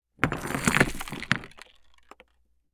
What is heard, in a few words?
Sand Pebbles; TOOLKIT vol.2